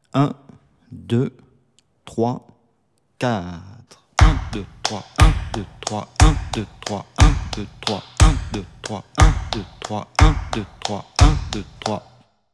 exercice methode pour travailler un rythme decoupe alternative 060bpm

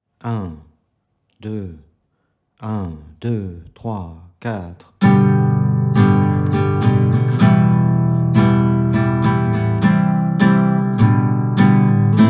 100bpm, guitare

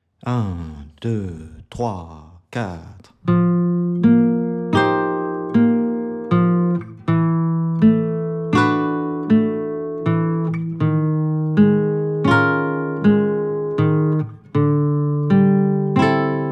exercice demi barre sur 5 cordes exercice b 080bpm

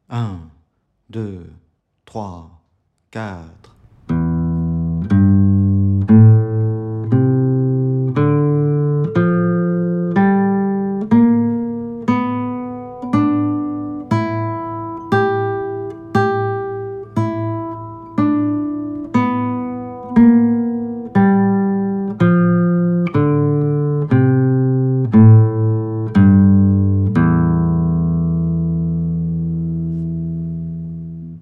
exercice 060bpm

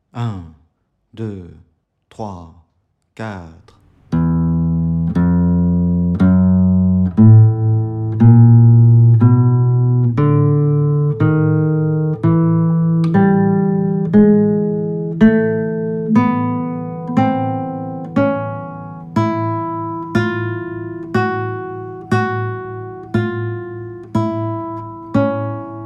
exercice 060bpm

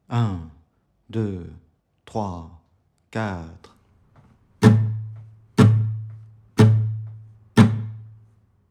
exercice 060bpm 1 mesure

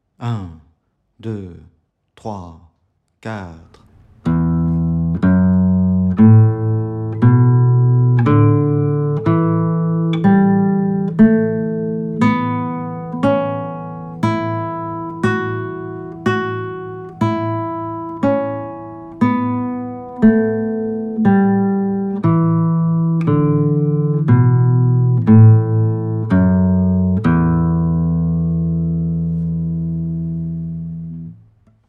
exercice 060bpm

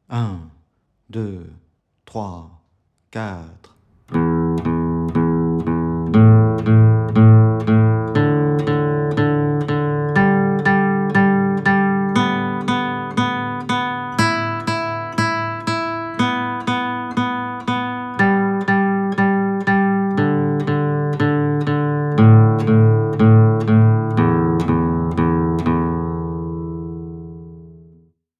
exercice b 060bpm

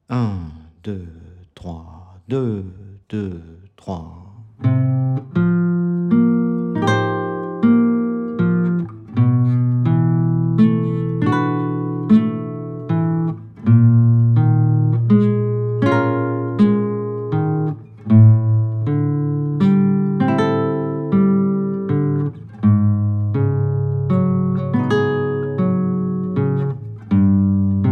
exercice grand barre exercice b 080bpm

